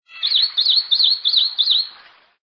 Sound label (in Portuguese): Hylophilus poicilotis Verdinho coroado